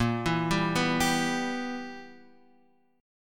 B Major 7th Suspended 4th Sharp 5th Bb

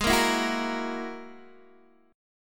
Ab 7th Suspended 2nd Sharp 5th down Guitar DADGAD

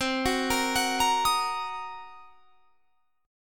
C Minor 7th Flat 5th, chord; Cm7b5; arpeggiated

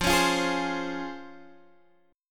C sharp Major 7th

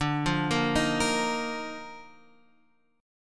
D sharp Major 7th Suspended 2nd D

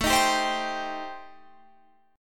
A-Minor 7th Flat 5th, Guitar Drop D, chord

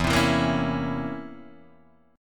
C 7th Flat 9th E

